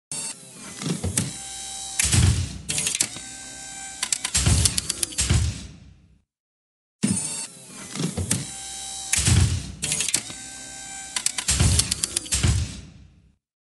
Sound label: Robot Turning Sound Effect